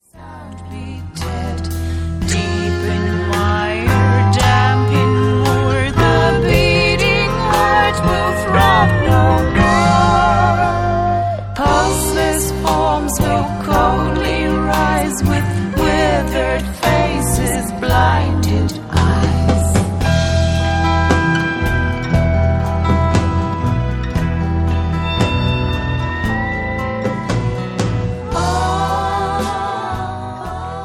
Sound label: chant